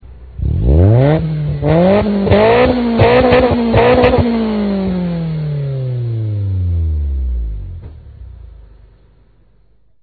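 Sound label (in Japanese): border2, 92LEVIN+5Zigen Border2 レーシング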